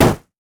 red minion mech basic attack; LoL

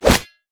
snap trap; LoL; caitlyn

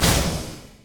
fengshuiengine; riven

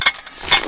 basic attack draw; LoL; varus skin03

